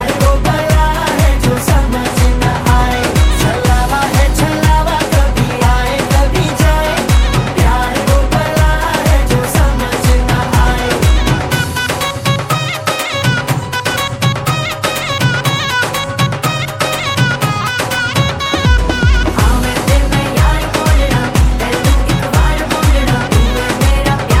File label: song ringtone; chhalawa title song